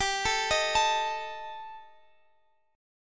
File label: G Suspended 2nd Sharp 5th, Guitar Drop D; chordshape; down